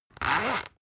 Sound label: zipper close